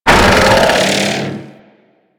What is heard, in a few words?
Thumper Pain Groan D; Warframe; Grineer Thumper Bot